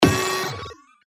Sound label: Foundry, percussion; revealed percussion vol 2